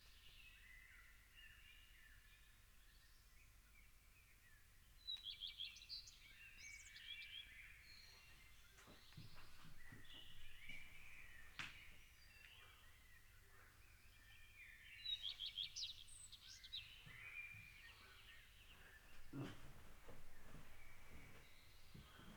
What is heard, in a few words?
zuehlsdorf soundscape